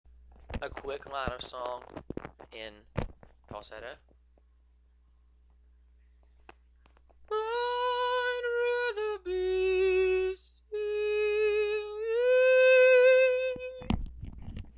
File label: Falsetto